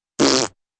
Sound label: raspberry